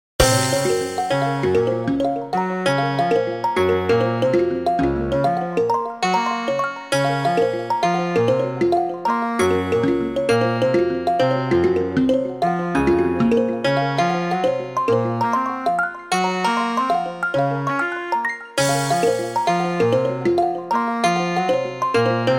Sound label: two beat